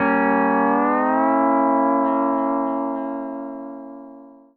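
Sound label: pedal steel chord G dim7 R, Country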